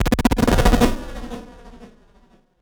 texture synth; trailer; Bluezone Corporation

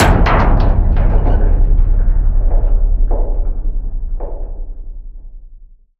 heavy metal impact huge industrial container; Bluezone Corporation

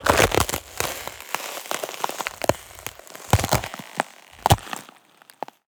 ice crack break; Bluezone Corporation

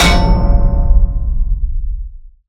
cinematic metal impact; Bluezone Corporation

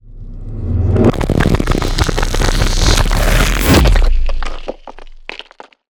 whoosh hit debris; trailer; Bluezone Corporation

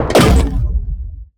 weapon scifi shot; invader; Bluezone Corporation

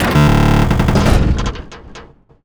annihilation mech mechanical texture sequence; robot; Bluezone Corporation

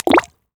creature crab texture water bubble; video game; Bluezone Corporation

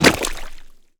mountain dweller creature orc footstep mud; Bluezone Corporation

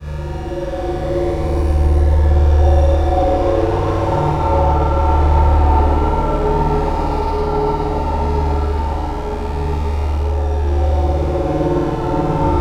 black project cinematic ambience; scary; Bluezone Corporation

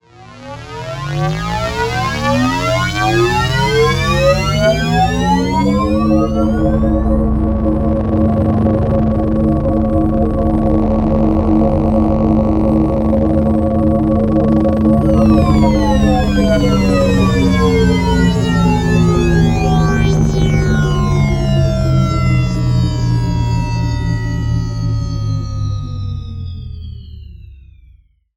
energy, science fiction; Bluezone Corporation

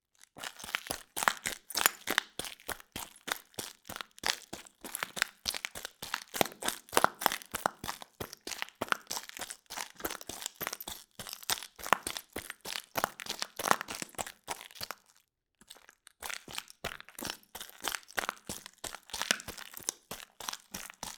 Glass Running, Footsteps; Todd Blankenship

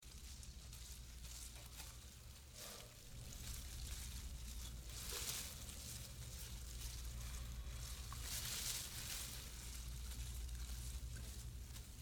Leaves Rustling; Todd Blankenship

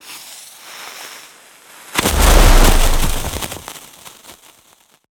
texture fire smoke grenade; weapon; mountain dweller; Bluezone Corporation

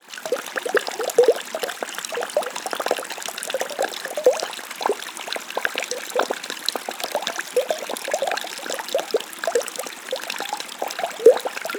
wilderness flowing water stream small babbling; Bluezone Corporation

